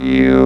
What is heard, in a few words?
Bass Box Vowel U; Mo' Phatt; Digital Sound Factory Mo' Phatt